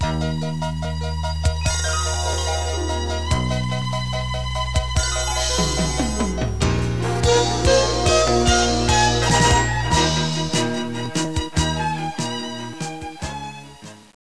funky

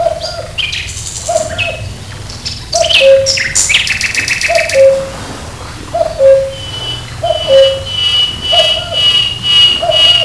bird echo

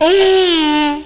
eeehh

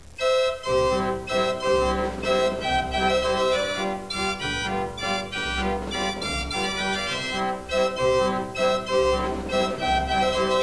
orch

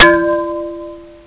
crystal

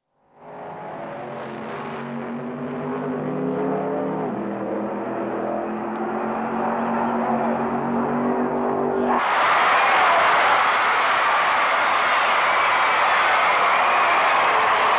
drag race - finish; Sport

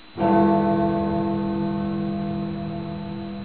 big ben single

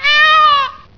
birdy

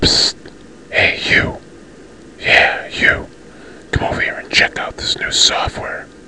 pssst

